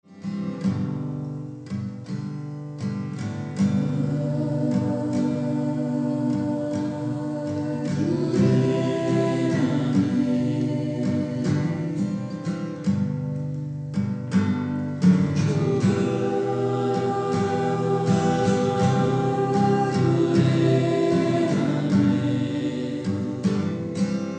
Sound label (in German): Mantren und Herzenslieder, ad gurey